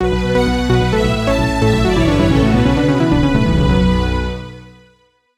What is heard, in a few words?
end of level